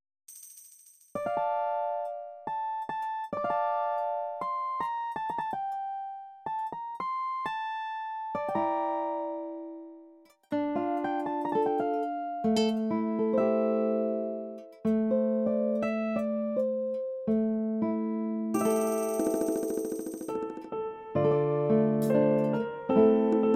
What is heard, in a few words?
Piano Cover